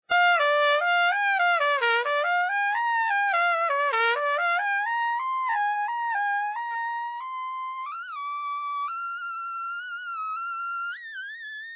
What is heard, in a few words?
slurs